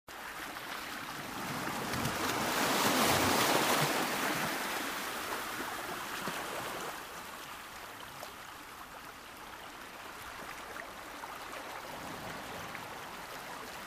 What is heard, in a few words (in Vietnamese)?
tieng song cuon